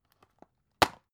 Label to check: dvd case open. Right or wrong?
Right